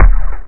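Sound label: bass drum; jazz jackrabbit 1; M8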